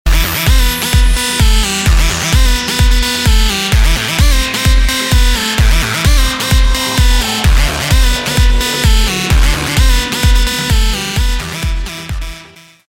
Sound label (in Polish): klubowe zm